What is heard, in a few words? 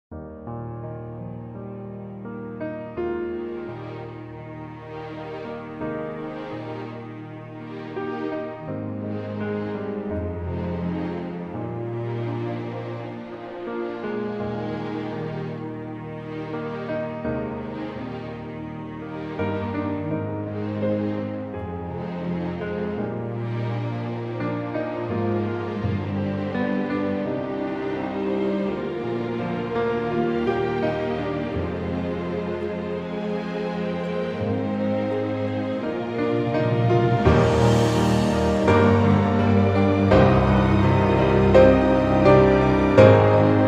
Music Only